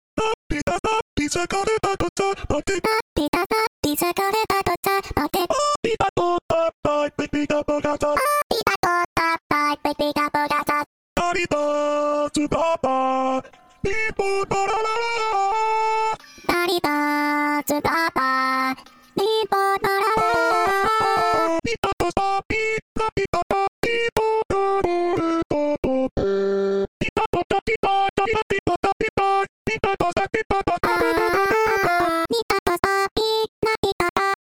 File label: Voices; Funkin